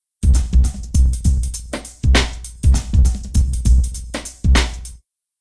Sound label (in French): Jingle